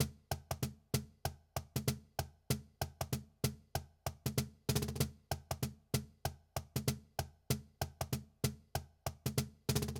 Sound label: TOPS, viral hiphop